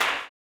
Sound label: CLAP, blues & soul; ILIO; Vocal Planet